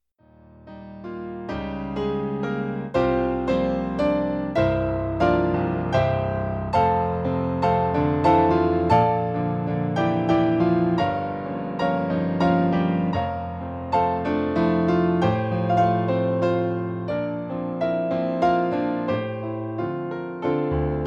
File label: GREAT IS THY FAITHFULNESS ADV PIANO